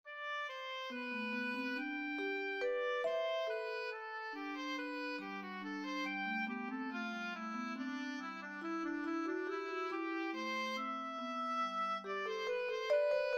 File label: inventing free counterpoint; The first task for this project was to create a second melody for two given short melodies